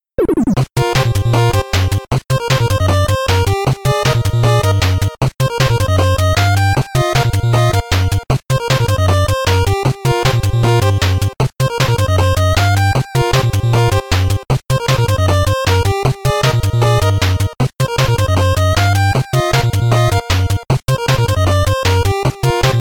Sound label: BGM CHALLENGE INTRO 8bit; Mighty No. 9; 8-bit soundtrack